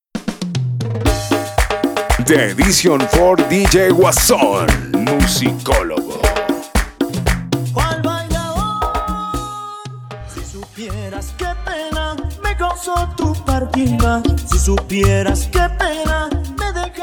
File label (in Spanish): Acapella percusion Orquesta 116 BPM